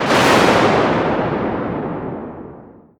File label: Thunder; doodle jump 2; android